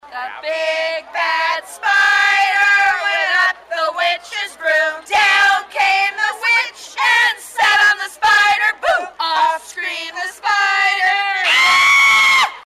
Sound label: chant; boo at zoo